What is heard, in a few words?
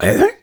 Mouth Fx, Vocal